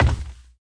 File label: Footsteps; unreal development kit